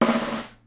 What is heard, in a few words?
SNARE, Live Snares; PlatinumPhattCD1; E-MU Producer Series Vol. 8 – Platinum Phatt